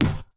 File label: Street Ball Acrylic Back, Street Basketball; SFX Kit